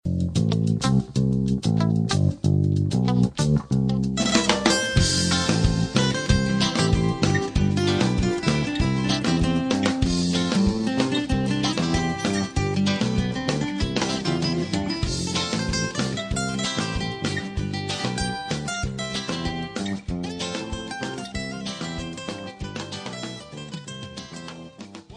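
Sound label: dance ind broken glass instrumental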